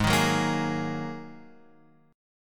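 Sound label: A flat 7th Suspended 2nd A flat Guitar Open F, chord; Ab7sus2; strummed; chordshape; JGuitar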